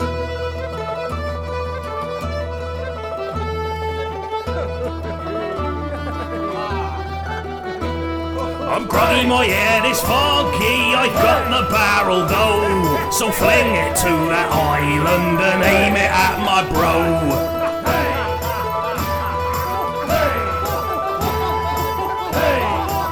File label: Chorus